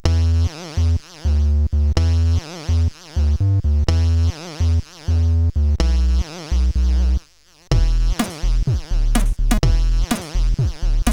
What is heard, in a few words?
Tekno, SID CSG8580R5